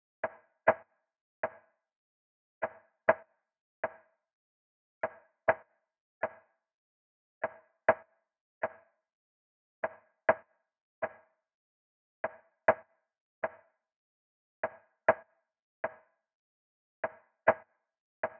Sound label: 100 BPM E Min Percussion; Loop Stems; Cymatics; Infinity Melody Collection; Betrayals